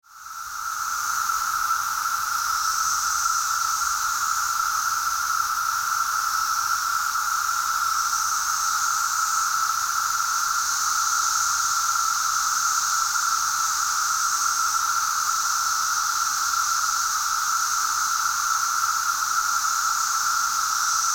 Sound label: cicadapalooza